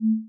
sine

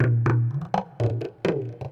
PERC LOOP ETHNO 125; eJay

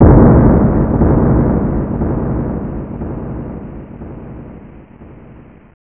Nova Boom; Maelstrom 1.02

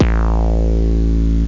FM Fat Moog

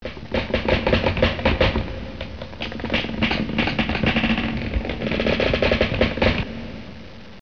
WIN ROPE

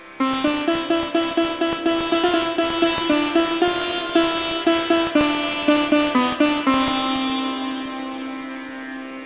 Anthem

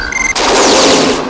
penetrating, electronic sounds; Sound Effects for Multimedia - Volume 1

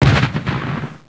CANON, Guns; PaxImp; PC Gamer 50

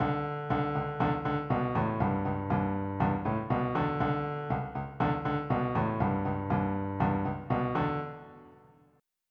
Reggae

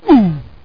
male oof; landslor

